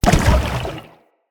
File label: Staff Caster Proj Destroy; Weapons; Staves; Dendrit Staff Caster